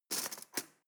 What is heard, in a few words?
cutlery teaspoon drawer grab hard